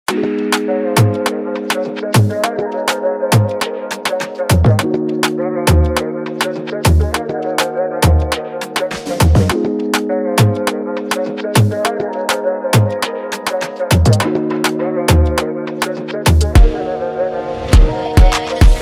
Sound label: afrobeat type beat x dancehall type beat